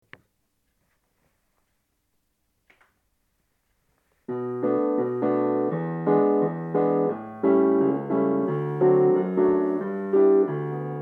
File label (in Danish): tenor; alle gaar